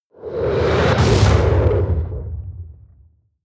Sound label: military jet fighter shot damaged